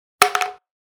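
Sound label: falling sound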